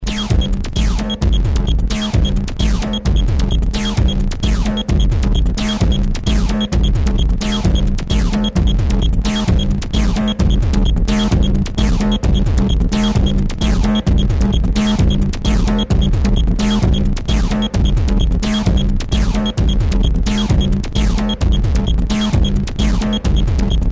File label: Analogue Bubble Bath